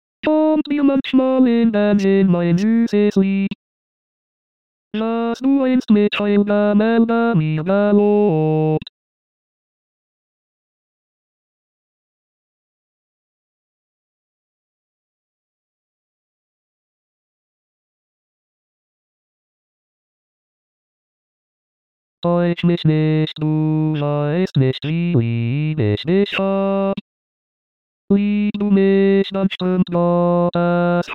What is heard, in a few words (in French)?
basse